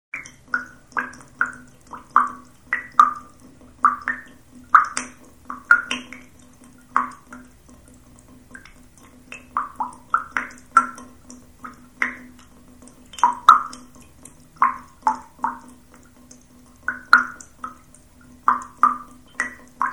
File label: Dripping faucet; SOUND EFFECTS1